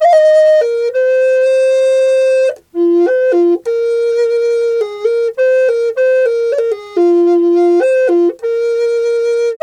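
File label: flute, 100 BPM; Didgeridoo and Other Primitive Instruments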